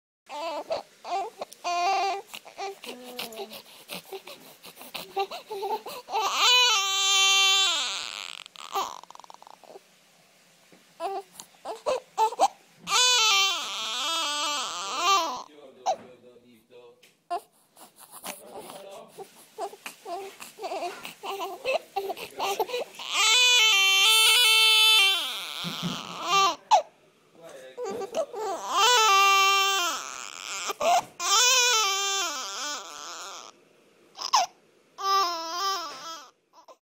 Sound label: chant